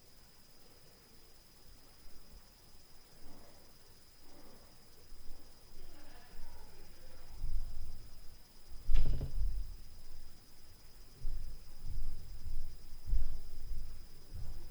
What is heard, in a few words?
Upstair LF Noise + Speakers; Night Noise